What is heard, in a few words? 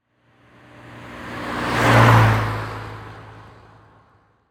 Saturn Drive By; Auto; Transportation